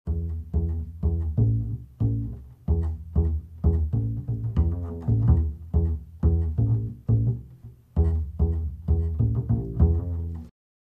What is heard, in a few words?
Bridge Riff